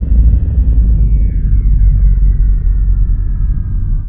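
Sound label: Landing Ship land; Star Wars Clone Wars; XBOX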